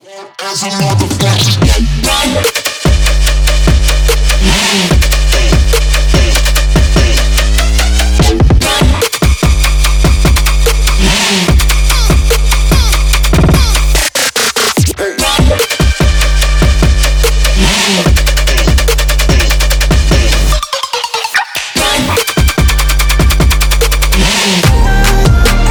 engine

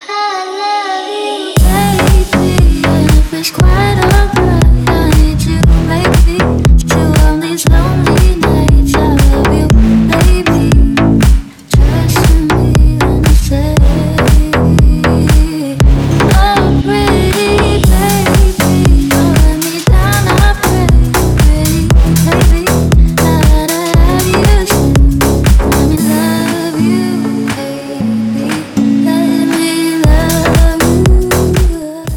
lofi

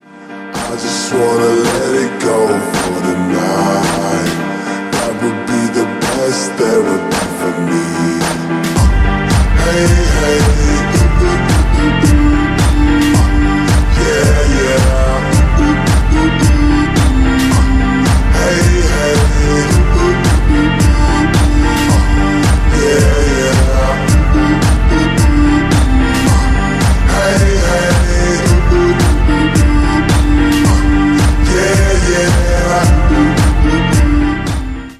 slowed reverb

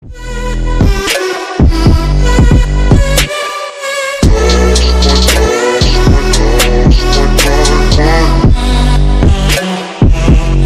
trap remix

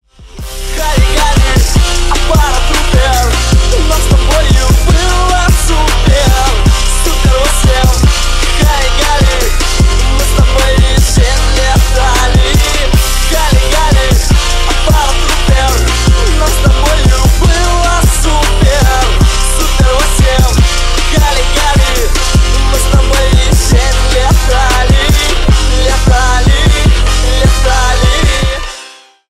phonk edition

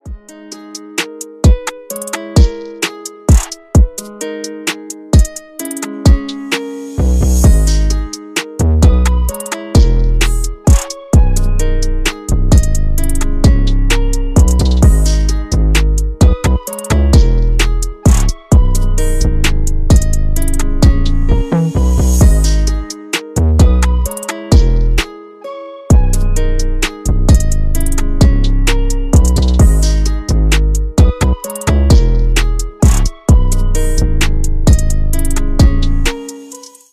guitare instrumental

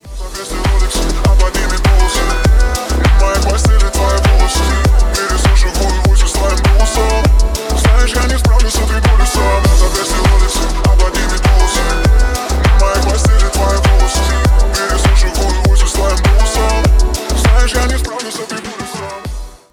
remix

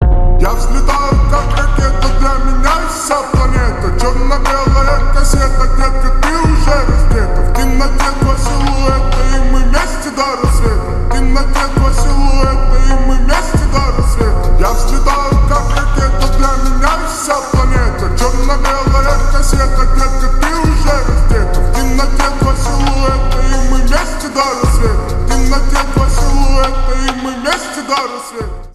slowed reverb

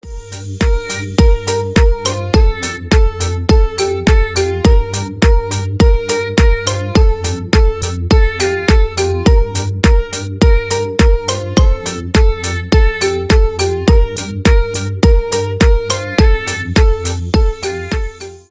marimba remix

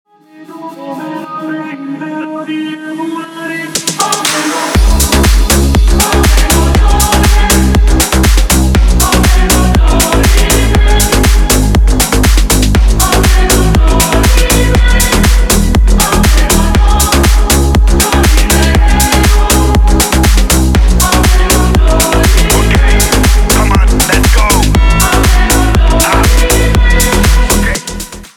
amapiano remix

